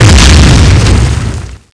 shoot exp; cstrike; weapons; gungnir

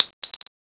shell, weapons; cstrike

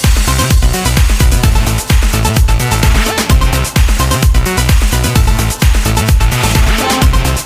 dance; cstrike; zm behind boss

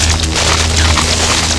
shoot loop; cstrike; weapons; gungnir

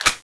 change, weapons; cstrike

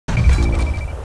Air Drop Appear; cstrike; CS BattleRoyale Sounds

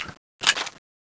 change, weapons; cstrike

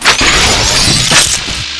shoot charge; cstrike; weapons; gungnir

